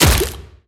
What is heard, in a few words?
cage wall bounce